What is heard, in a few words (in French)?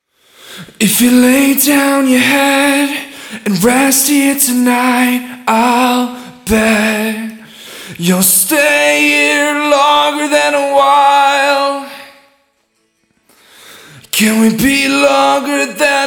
chant delay sans filtre passe bas